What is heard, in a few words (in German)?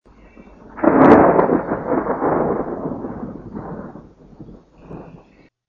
donner